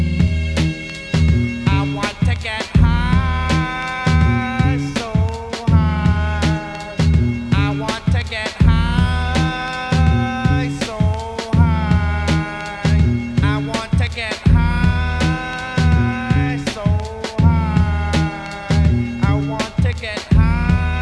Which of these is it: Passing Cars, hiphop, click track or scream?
hiphop